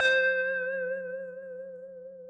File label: guitar pretty note C